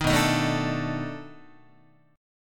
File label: B 7th Sharp 9th, down, Guitar; strummed